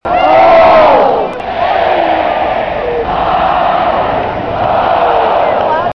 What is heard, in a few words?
barf again